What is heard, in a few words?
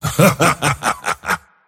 mars laugh; dota